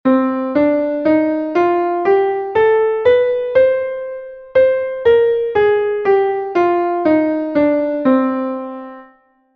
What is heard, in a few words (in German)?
C-Moll-Tonleiter